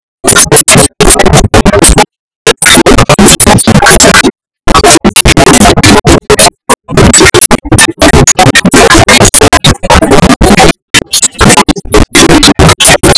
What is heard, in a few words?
Shave